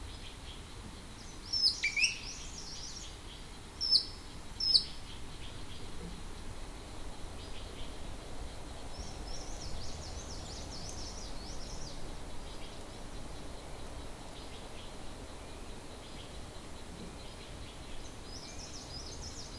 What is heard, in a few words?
mixture of bird and insect